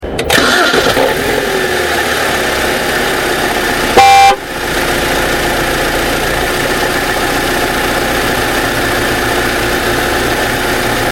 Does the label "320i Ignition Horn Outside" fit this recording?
Yes